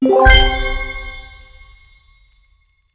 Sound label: Card Upgrade Burst; Golf Blitz 1.12